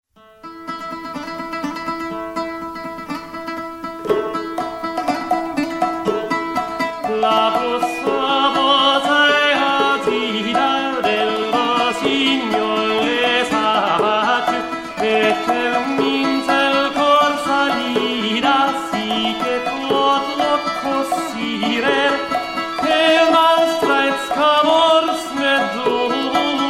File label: troubadour song